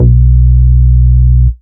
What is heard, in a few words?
Root B, Ultimate Bass; Vengeance Samples